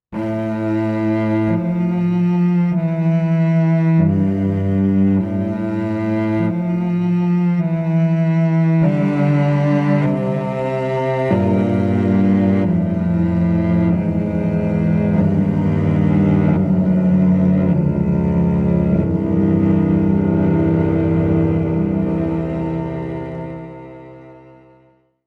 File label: MK VI Cello noodle, Mellotron